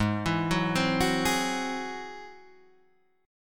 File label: Ab Augmented 7th, Ab+7 chord, guitar; arpeggiated